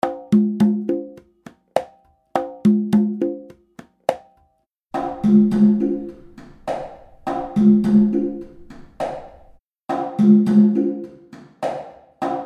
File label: CONGAS DRY ROOM REVERB